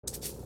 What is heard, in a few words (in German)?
upgrade nicht moeglich; Stardew Valley